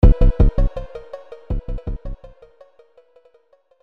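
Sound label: phonk end; BakersAdventure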